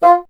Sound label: WND BSN, Bassoon